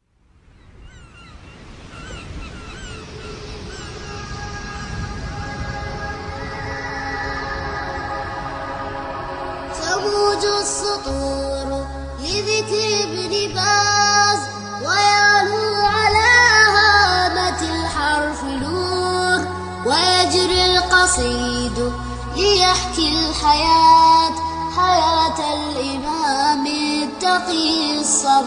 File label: Arabic Nashid Islami